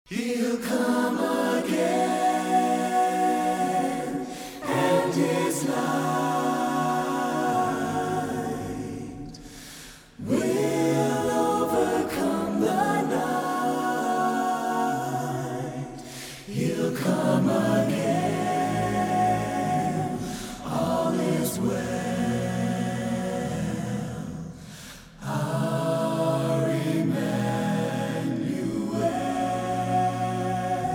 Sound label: A Capella